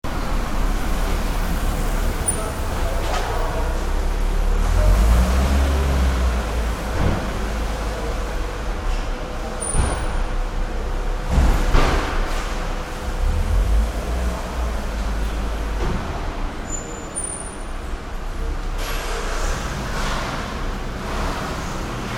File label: Underground Car Park, Atmospheric ambiance of underground multi story car park.; Traffic and Cars